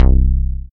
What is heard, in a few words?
Bass